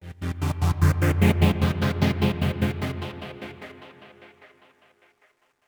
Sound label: Oneshot Synth; zombie army 4 dead war ps4 stadia switch windows xbox one gamerip 2020